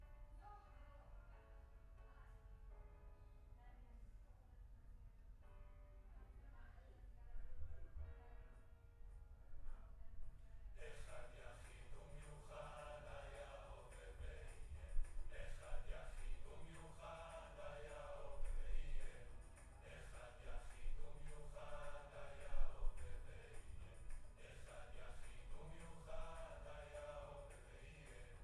Choir Far

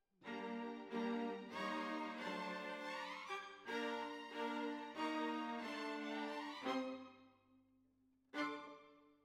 Violas Over

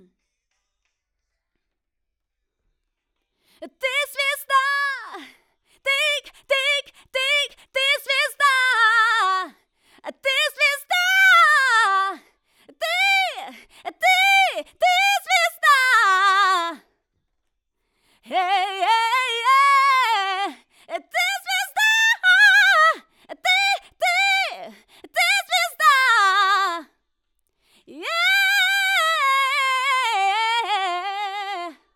Soul

